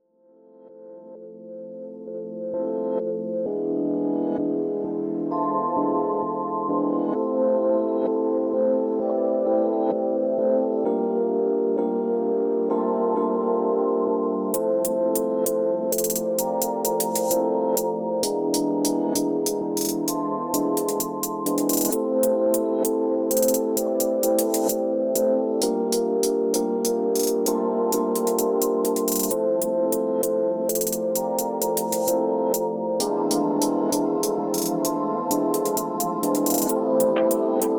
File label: Ambiance Chill